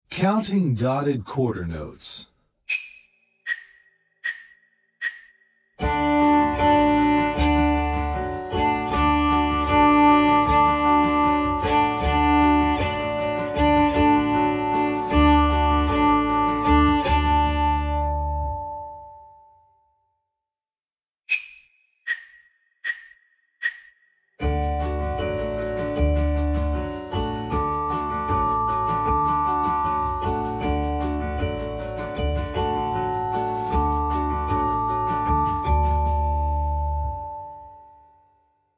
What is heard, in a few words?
Counting Dotted Quarter